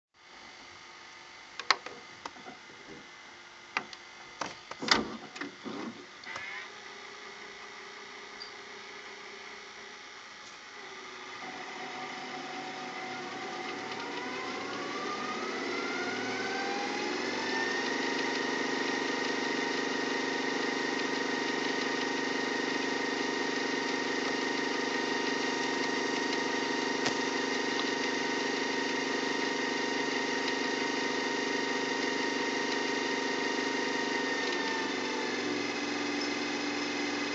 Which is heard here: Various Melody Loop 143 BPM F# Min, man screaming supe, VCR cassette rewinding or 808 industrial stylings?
VCR cassette rewinding